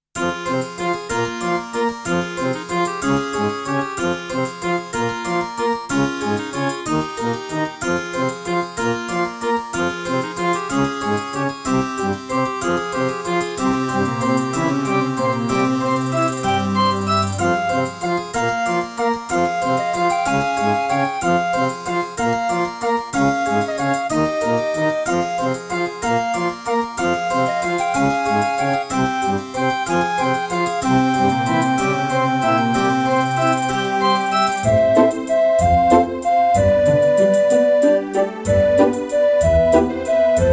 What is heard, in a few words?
Track 6, Yamaha CBX-T3; Death Gate